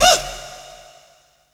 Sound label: stab ah